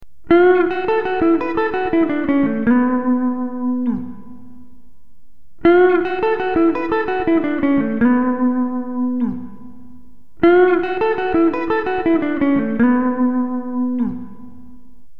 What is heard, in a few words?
bmin, guitar